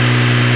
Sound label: eng car; ROADRASH